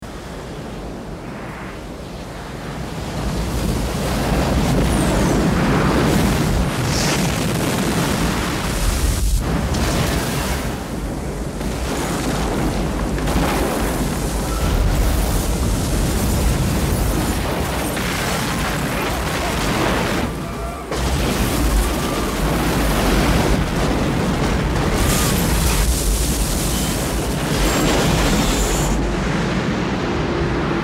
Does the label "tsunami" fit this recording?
Yes